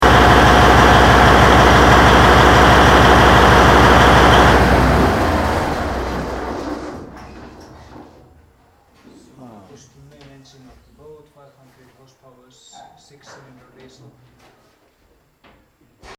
coupure moteur diesel

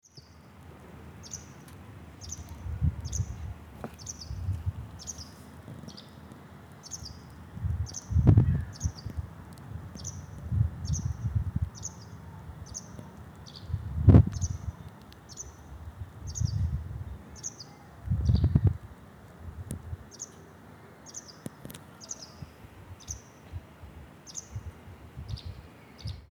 territoires inondables IJBURG chant oiseau

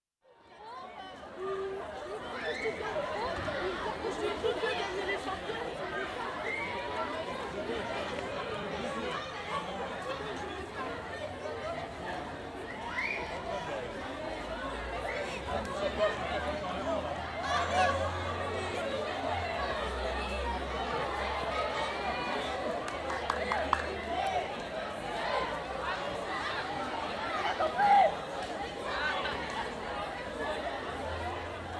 La recre en exterieur; Metabole

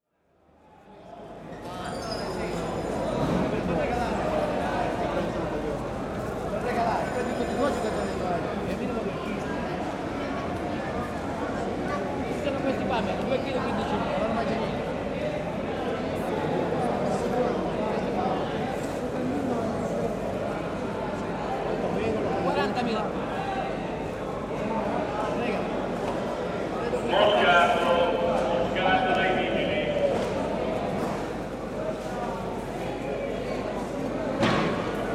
genes joyeux commerce

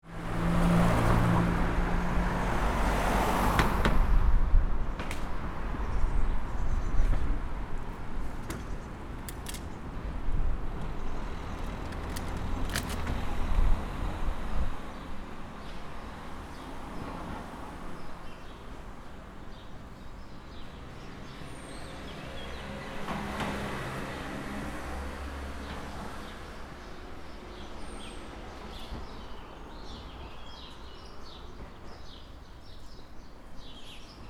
urbain paysager; sonore contexte

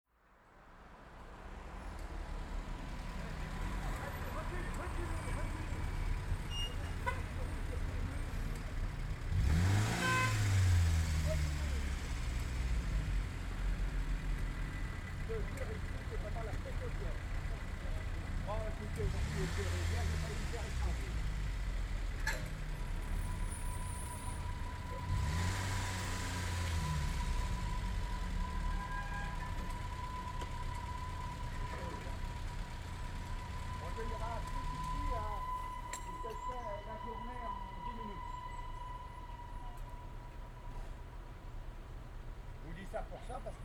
portee ferroviaire petaudiere

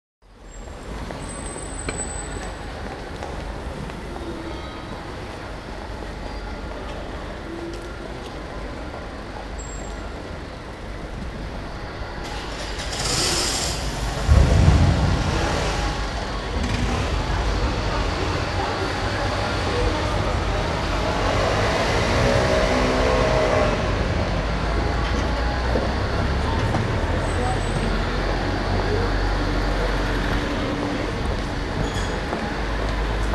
espace publics tramway; felix poulat

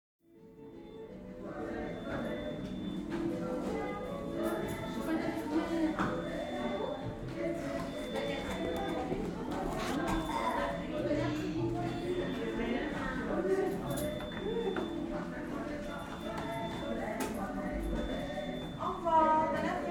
Ecoute hopital Apres Midi Unite

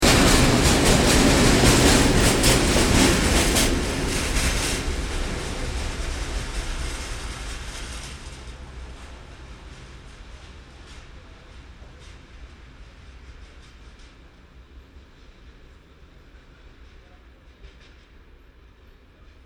FX remanence gare

